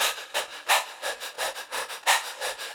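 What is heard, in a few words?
Breath 1bar key G minor 87bpm, Vocals; SixFeet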